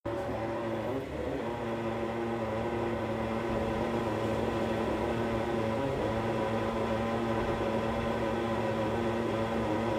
valve area, lavadora; minha lavadora samsung esta fazendo barulho; home-appliances

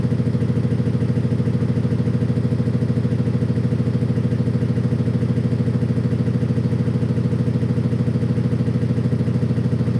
weight assembly, minha lavadora samsung; minha lavadora samsung esta fazendo barulho; home-appliances

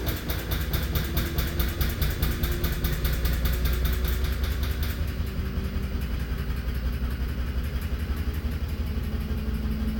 spring friction; minha lavadora samsung esta fazendo barulho; home-appliances

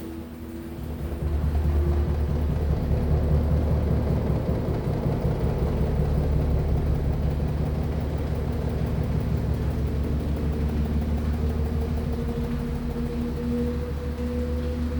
Spinning Abnormal level, lavadora samsung; minha lavadora samsung esta fazendo barulho; home-appliances

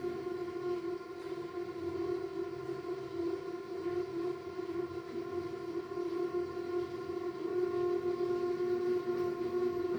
drain pump area; minha lavadora samsung esta fazendo barulho; home-appliances

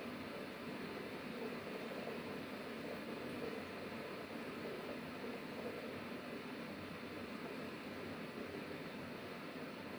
normal noise, lavadora samsung; minha lavadora samsung esta fazendo barulho; home-appliances

